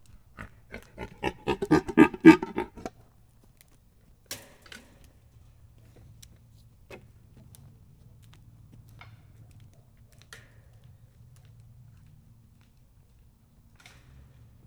gimszarvas horkantas erosodik; budakeszi zoo 2010

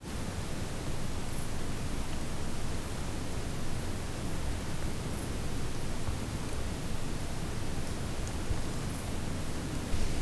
erdő négy alkalommal patkósdenevérek, 50 Hz; octava50hz; repülés; termeszetben

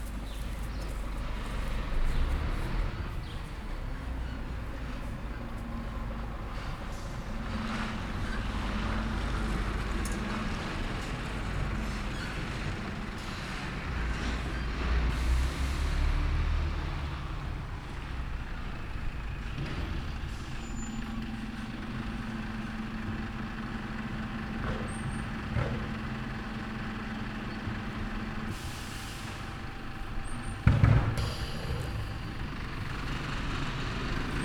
egy utcaval arrebb, szemetesauto; varboc

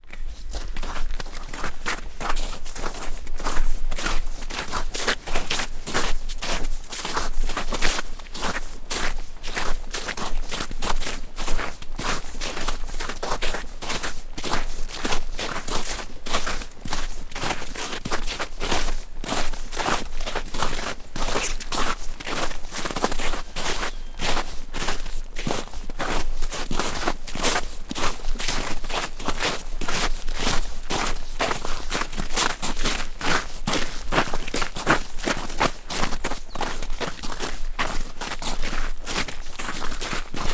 februári hó olvadásban, hó gyalogolás; Visegrádi-hegység

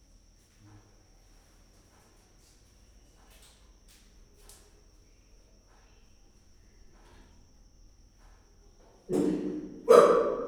hangot ad zörgő rácsozat, csimpánz; gyori zoo 2014

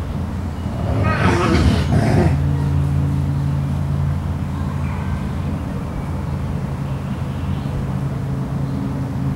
kisebb morgás, szürke farkas; szegedi zoo 2011

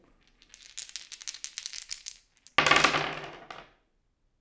kockavetés, dobókocka; Perkupa

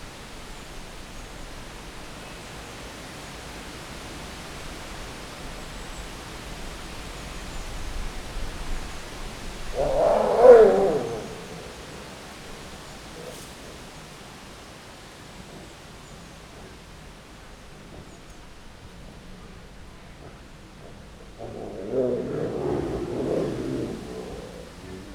párzó állatok erősszel, bengáli tigris; gyori zoo 2014

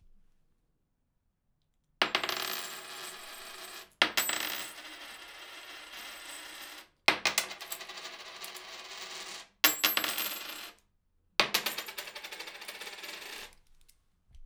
egyenként asztalra dobálás, pénz; angyalfold

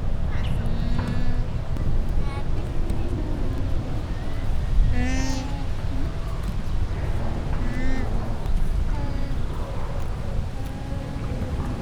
viszonylag jól hallhatóak, enyhült a szél, láma; Győri Zoo 2014

